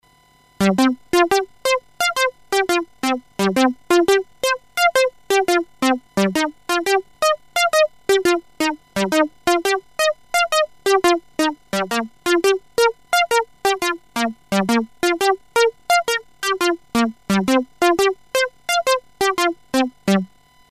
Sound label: Delay Arpegio off